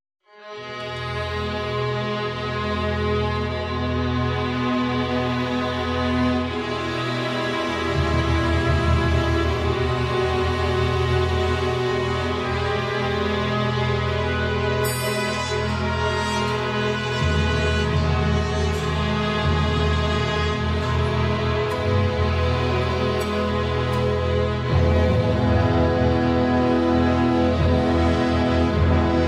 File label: trumpets and orchestra; farewell fanfares